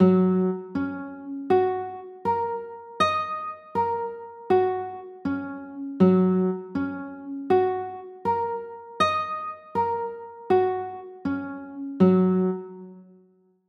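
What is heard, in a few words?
G flat 6 chord, guitar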